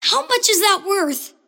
lasthit pain; kidvoker; dota; invoker

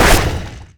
attack impact; weapons; dota; necrolyte

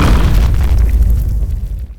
sunstrike ignite; weapons; dota; invoker

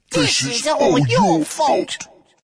death; dota; ogre magi

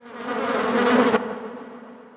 decay transfer; undying

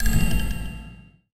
panorama menu activate open; ui

